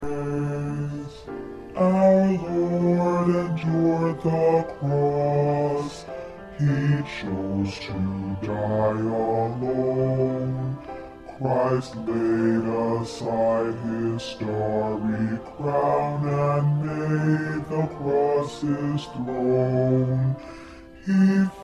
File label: Bass